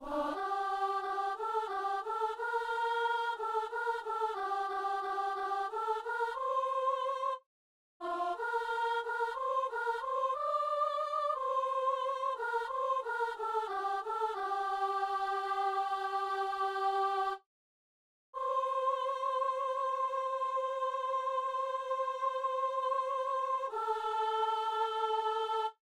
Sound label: soprano; Allez par toute la terre; Messe du 17 janvier à Saint-Denis